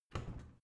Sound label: washing machine modern open